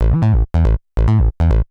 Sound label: TECHNO BASS 140 A